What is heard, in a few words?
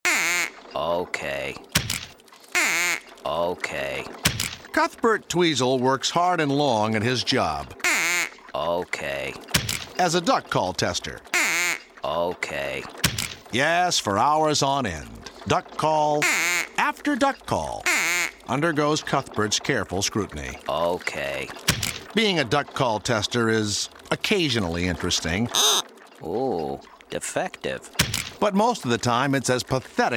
las vegas duck call tester